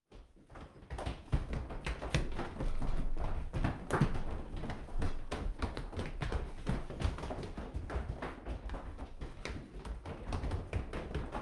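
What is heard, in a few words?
interior footsteps house party movement fast; Sonniss GDC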